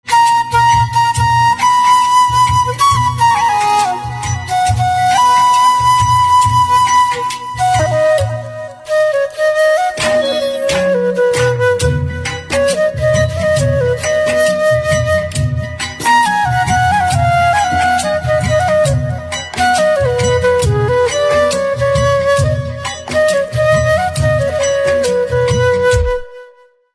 Flute

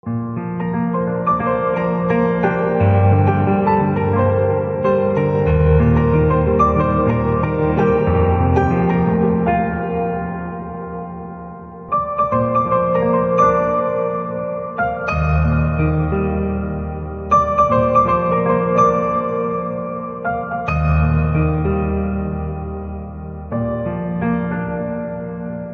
Piano Instrumental Ringtone

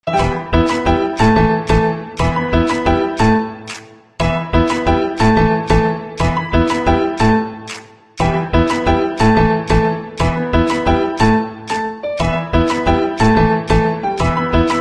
Piano Instrumental Ringtone

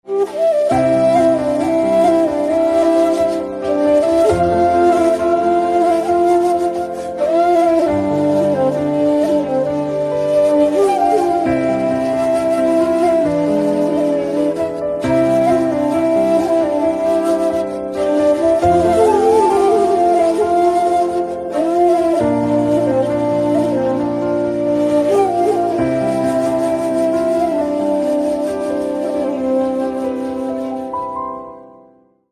Flute Instrumental Ringtone

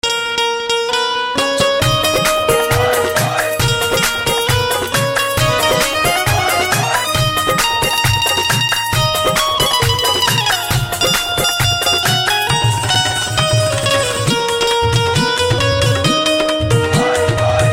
Banjo Instrumental Ringtone

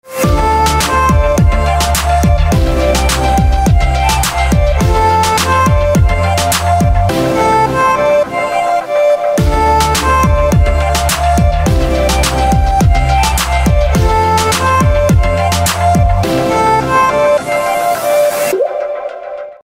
Violin Music Ringtone

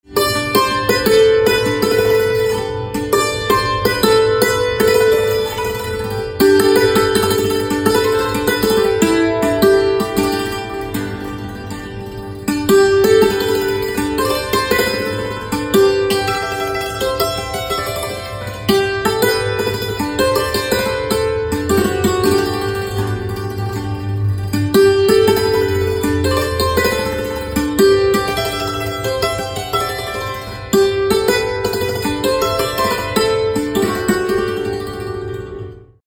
Santoor Instrumental Ringtone

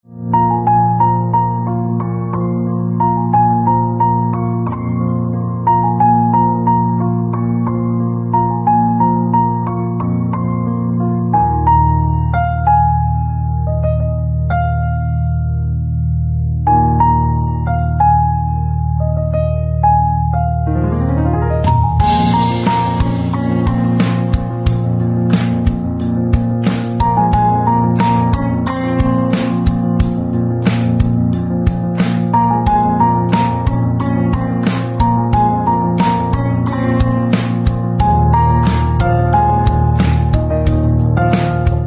Piano Instrumental Ringtone

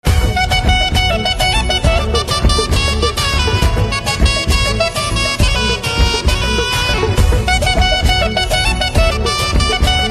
Saxophone; south indian instrumental ringtone

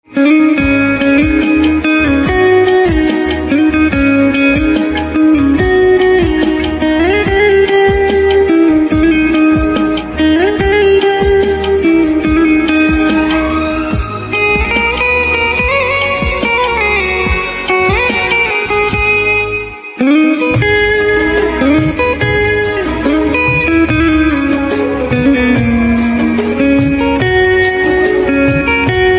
Guitar Instrumental Ringtone

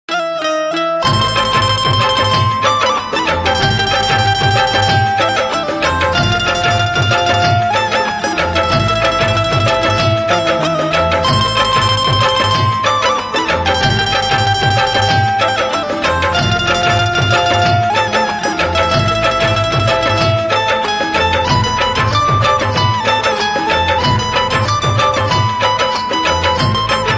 Banjo Instrumental Ringtone

